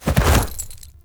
Horse Jump Heavy; Vehicles; Foley Actions; Warframe; Duviri

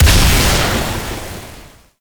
Projectile Death Large; Weapons; Warframe; Duviri; Incarnon Adapters

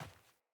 Sandals Run Dirt, Footsteps

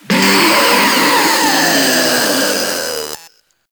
Proto Infested Ancient Death, Enemies Vocals

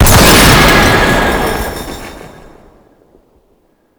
Power Cell Explosion; Warframe

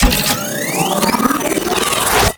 Charge Up; Crp BFG

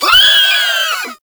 Volatile Runner Kamikaze Scream; Warframe; Proto Infested; Abilities

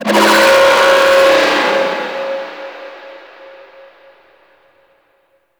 Space Pod Warning Call; Warframe; Grineer Pod; Vehicles

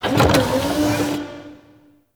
Servo Flutter; Ambience; Warframe; Corpus Railjack

